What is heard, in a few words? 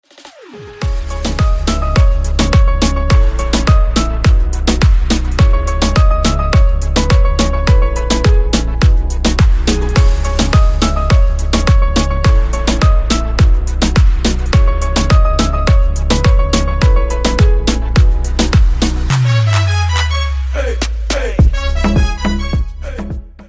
piano instrumental ringtone